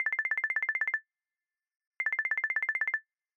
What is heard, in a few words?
call, ringtones